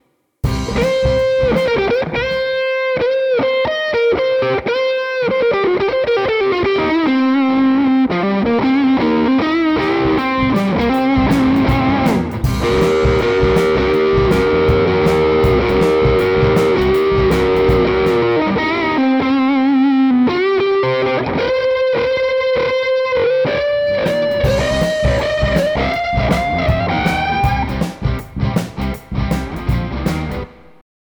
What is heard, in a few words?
Lead2 Shredmaster